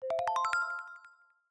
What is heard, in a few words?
Alarm; Windows